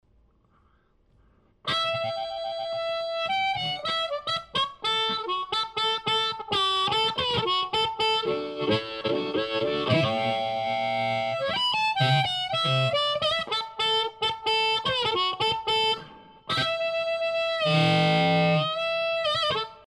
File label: harmonica